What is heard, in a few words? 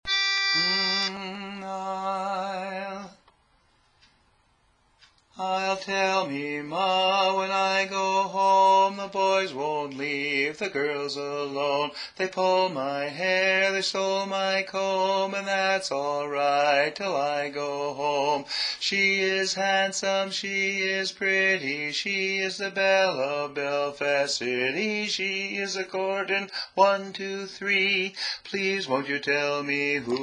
Bass; I ll Tell Me Ma